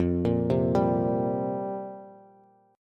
F Suspended 2nd Suspended 4th F down Bass; arpeggiated; JGuitar

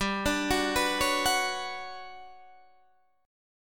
G Major Sharp 11th G down Guitar Open D

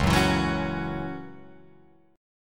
Db Minor Double Flat 5th, Guitar, Open F; 1,1,4,1,4,1; strummed

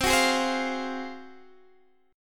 G sharp 13th C

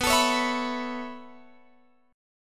B 9th Suspended 4th B down Guitar Open F; strummed

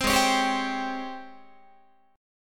G sharp Major 11th G sharp, Guitar; G#M11 Chord

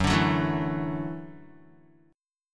Gb Minor 7th Sharp 5th, Guitar; strummed; JGuitar

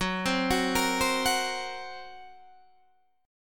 F sharp Major Flat 5th F sharp Guitar DADGAD

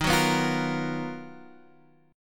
B Major Sharp 11th Eb

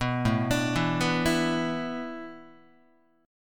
A Suspended 2nd Suspended 4th, Guitar; Asus2sus4 arpeggiated

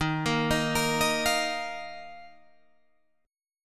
Eb Suspended 2nd, Guitar, Drop D

